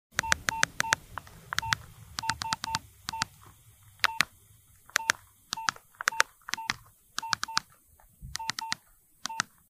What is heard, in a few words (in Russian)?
Звук печатает на старом сотовом телефоне